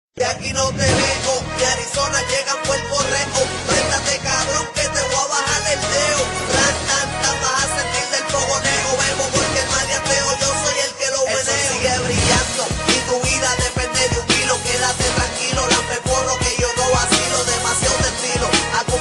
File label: Freestyhle